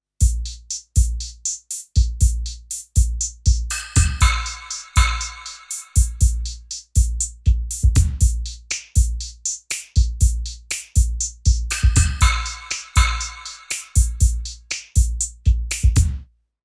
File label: Kawai XD-5 Groove